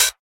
Royality free hat sample tuned to the G note. Loudest frequency: 6411Hz; studio hi hat g key; drum single hits one shots